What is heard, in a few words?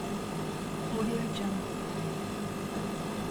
air conditioning external unit loop